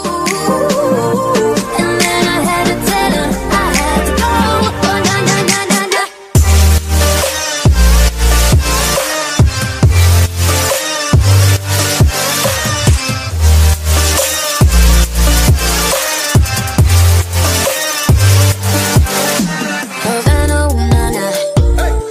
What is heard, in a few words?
english ringtone; english ringtones